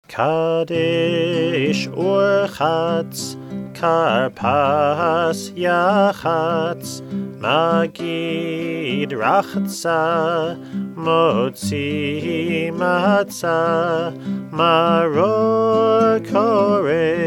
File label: Kadesh Urchatz